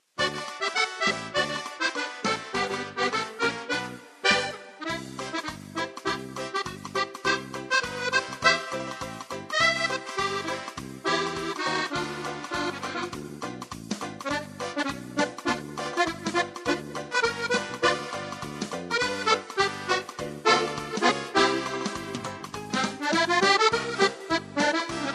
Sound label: charleston